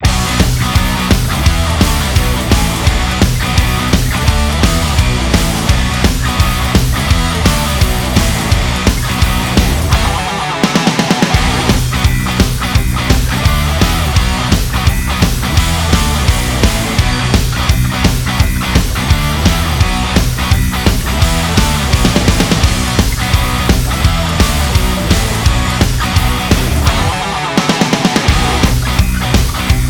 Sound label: Instrumental; Gintama